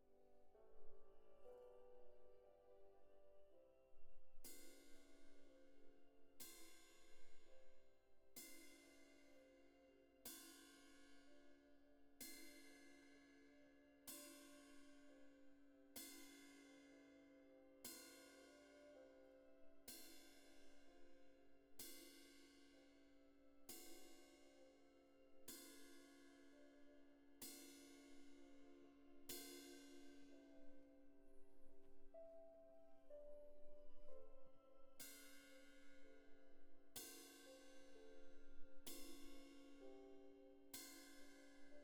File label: Cymb Close